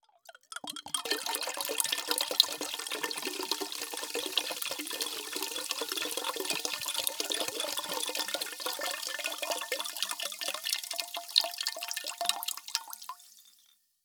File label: Burst, glass, release, 2 bottles, pour, fizz, cistern, low, marble, jostle, gurgle, wavering; Eiravaein Sound